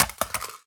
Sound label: phys mag drop ar metal concrete, magazine drops